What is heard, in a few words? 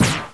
shot